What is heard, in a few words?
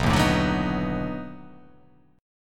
B 7th Sharp 9th, down